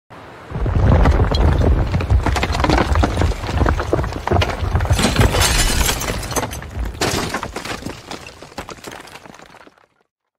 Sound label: house collapse